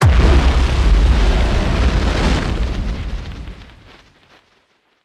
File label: ash cloud explosion; Factorio